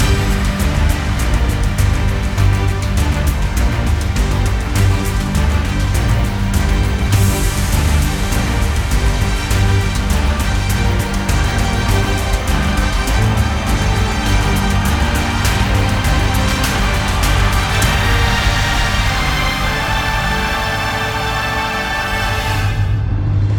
Battle

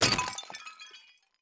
Pot Death; Hollow Knight

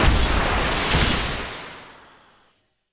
Hell Sword Strike Impacts

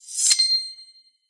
Sfx Darts

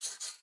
Sketch Env Write; Maze

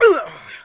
Male Hurt; Mars Sortie

